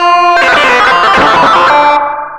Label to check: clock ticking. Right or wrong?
Wrong